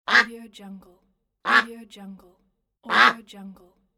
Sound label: duck